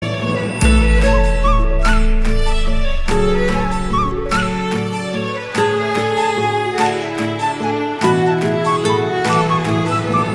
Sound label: instrumental ringtone